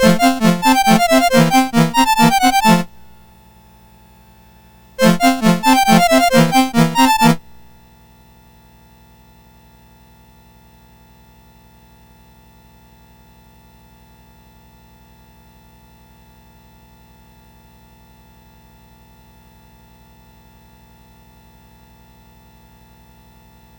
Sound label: Polonaise BASIC sid MOS6581R4